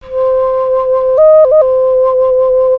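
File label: sound of village shepherd playing flute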